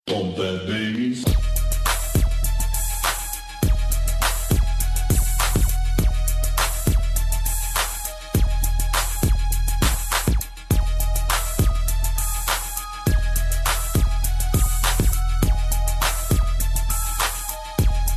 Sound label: pump that bass, hip hop